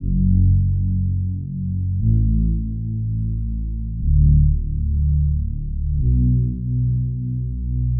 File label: Bass 120bpm F m Deep Pad